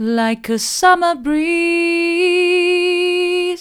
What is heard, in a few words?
like a summer breeze mid, vocal